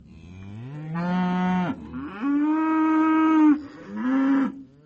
country; shockwave; fixitupkatesadventure

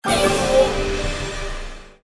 Combo Boom; Kung Pow

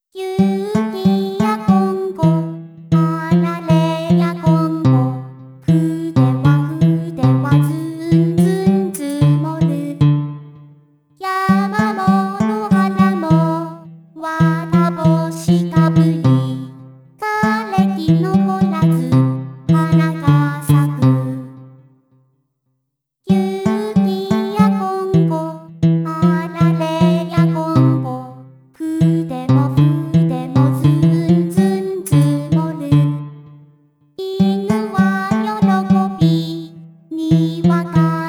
With Guitar

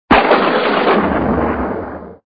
zap; demolition 2

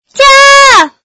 damage

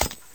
hit; madcars2; radicalplay

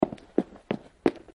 footsteps

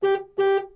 car avoid car horn; 2fast2curious

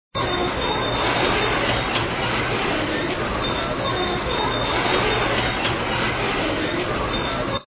Sound Lift Go, truck loader; coolmathgames

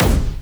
gun; madcars2; radicalplay

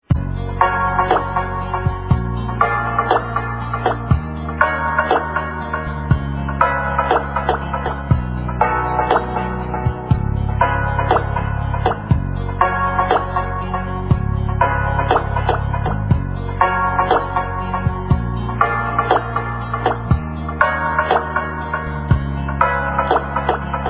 music; tangramz; shockwave

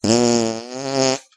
fart

